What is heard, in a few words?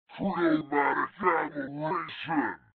chromatic